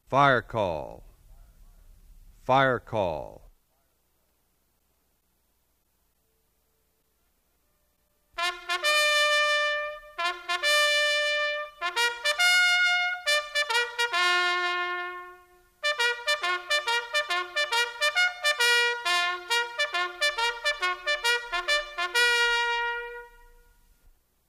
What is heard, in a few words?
fire call, bugle